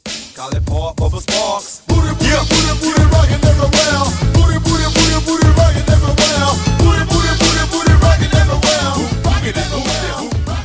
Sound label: Old School Hiphop Megamix